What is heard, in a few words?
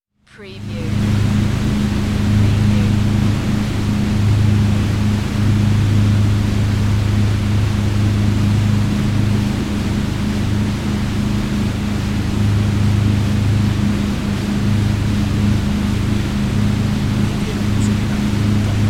Yacht Drive Bridge; boats; vehicle transport sounds; Sound Effects Bible